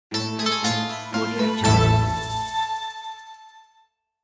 level complete oriental style

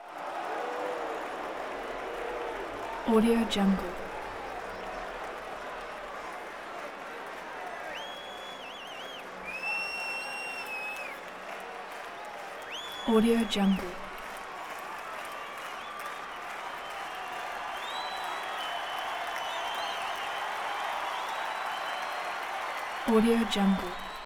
baseball crowd active swells

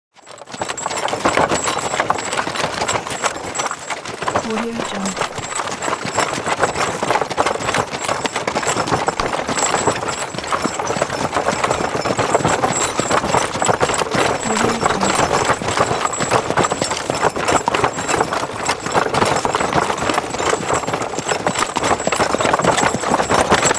horse and cart roll away with bridle jingle; hollywoodedge

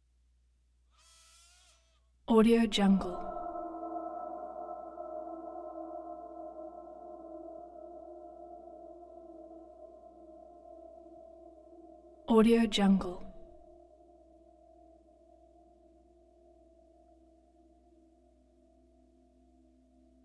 piano screams

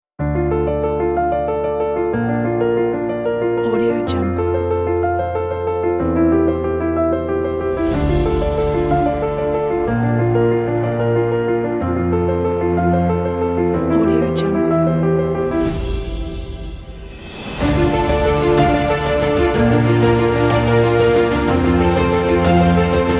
inspiring and uplifting piano; garybirdman

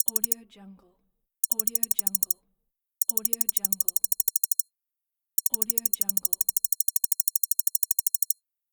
data processing

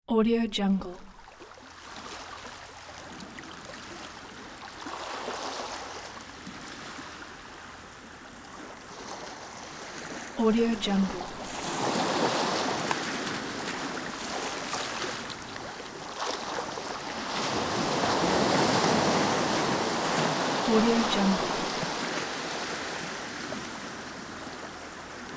ocean waves; vinyl sounds

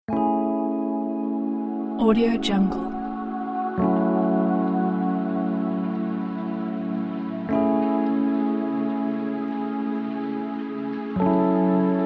deep chill ambient

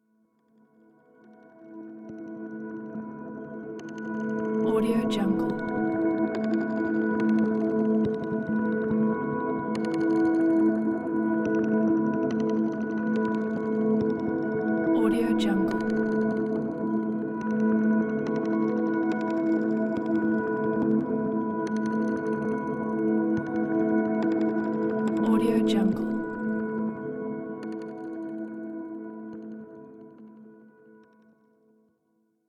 fantasy dream

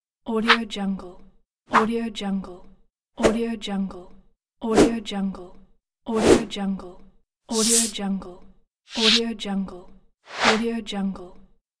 slide

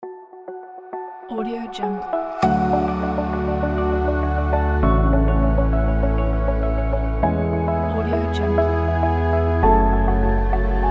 ambient logo pack

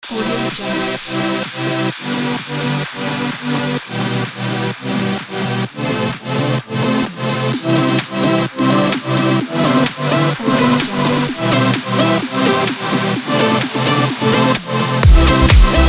summer dance party music

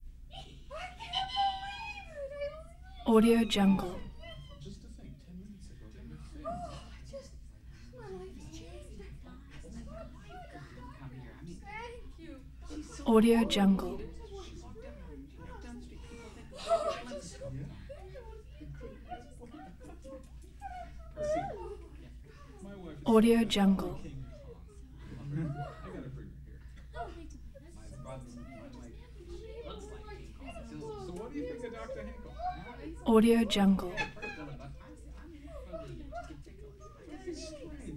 doctor office small group walla; prosoundfx

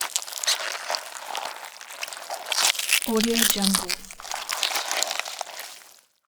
spider bite

